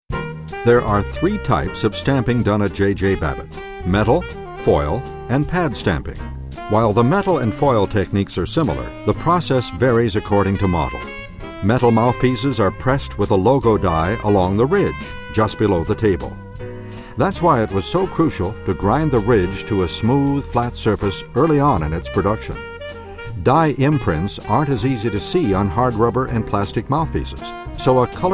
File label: stamping